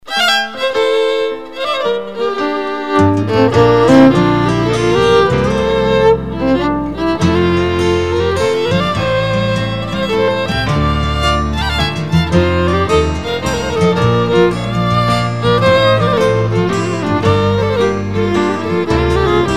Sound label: Waltz